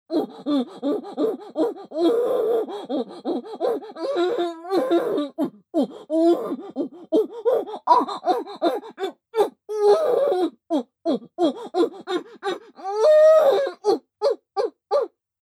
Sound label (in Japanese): バック・喘ぎ・さらに激しい, ASMR; loop音声